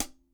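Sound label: closed hi-hat Acoustic Natural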